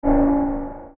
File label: Rollover Dark; Mouseover, Metallic, Sci-Fi; Multimedia; Production Element, Imaging Element, Accent, Transition; Mouseovers; Blastwave